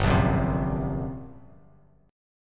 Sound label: G Minor Major 7th Double Flat 5th, G, Bass Standard; strummed; JGuitar